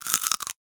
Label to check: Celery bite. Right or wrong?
Right